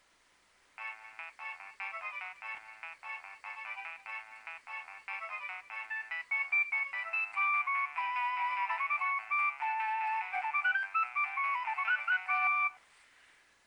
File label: nokia3100 ringtone